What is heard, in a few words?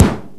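room kick, FM drums; East West; Global Sounds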